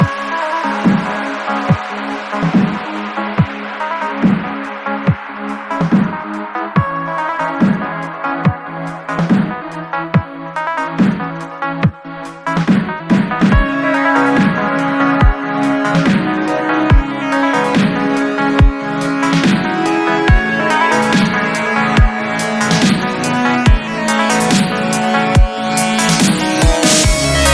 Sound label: Darkness (Instrumental)